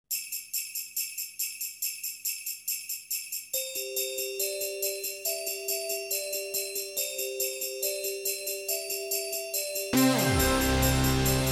Techno Christmas - Jingle Bells

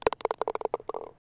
golf ball into cup

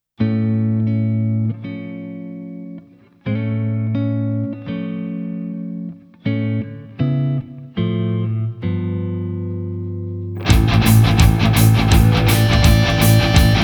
Instrumental

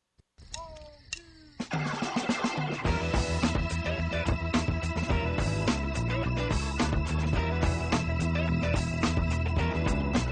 background funk

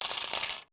change falling

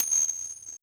Ting Popup Pixels

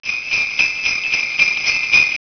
Jnglbell

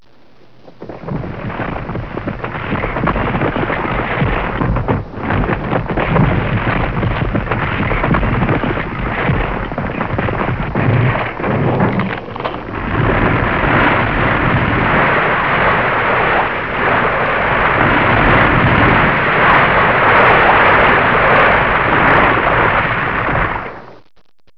Avalanch; ok quality

